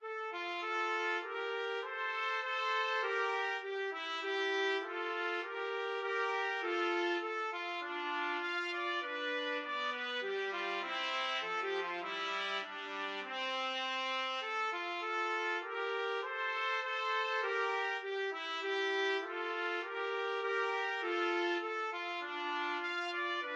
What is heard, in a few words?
minuet trumpet duet